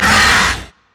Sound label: spider attack; LoL